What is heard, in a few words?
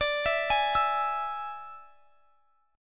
D Suspended 2nd Flat 5th; arpeggiated; x,x,24,21,21,24